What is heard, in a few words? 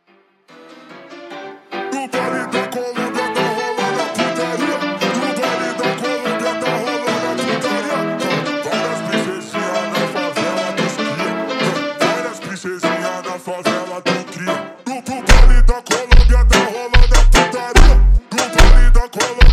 Funk super Slowed